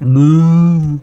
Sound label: moo